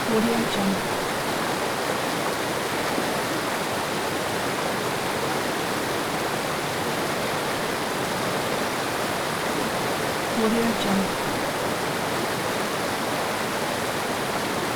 flowing mountain river

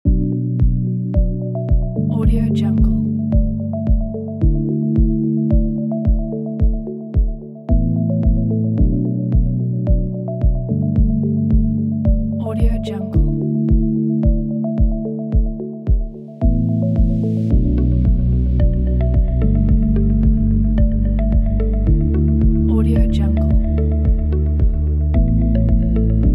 light calm

